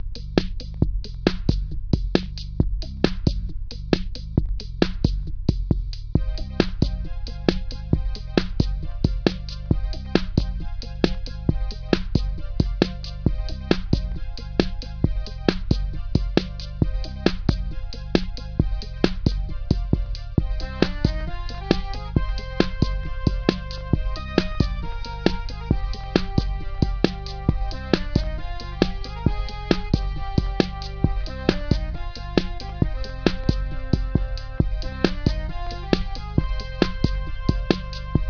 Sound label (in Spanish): musica